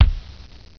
KICK

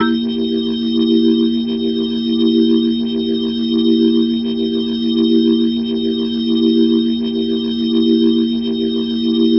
Glowing Organ C7; Dominant 7; The Vitale Organ

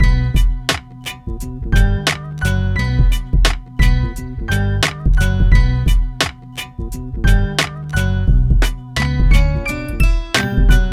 Groove Key, Hip-Hop; Mixed Elements

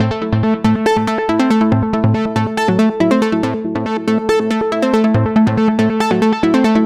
Dove Kiss, Synths Lead

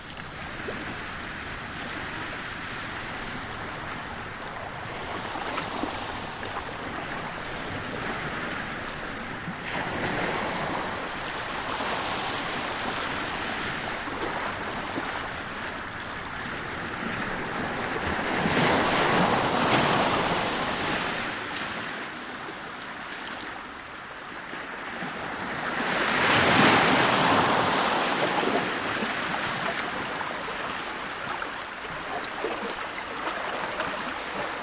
Shoreline - Harbour, OCEAN; Sounds of the Earth; Sleep Sounds of Nature

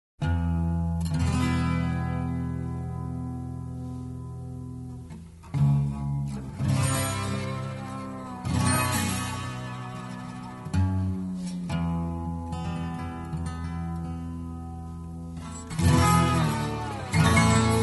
6 & 12 String Guitar

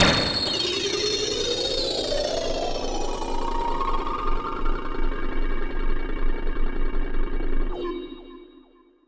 Vortex; Dance; SFX

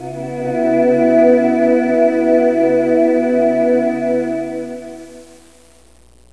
vox

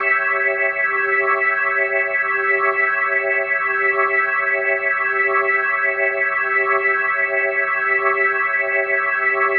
Medical Organ A7 and 8va; Dominant 7; The Vitale Organ

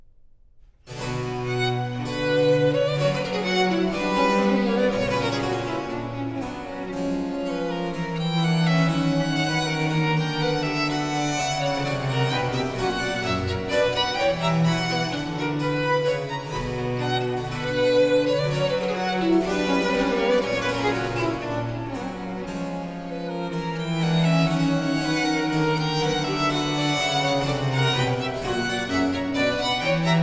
Canon 2 a 2 Violini in unisono (2 violins, cello; Bach; Musikalisches Opfer BWV 1079; Canones diversi super Thema Regium

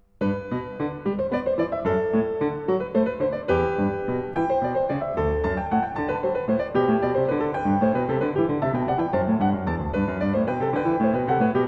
Canone, all'Unisono a 1 Clav., The Piano; JS Bach; Goldberg Variations; Variatio 3

